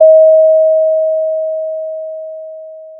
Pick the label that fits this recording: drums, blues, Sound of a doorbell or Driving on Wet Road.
Sound of a doorbell